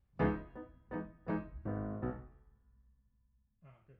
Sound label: Piano Mono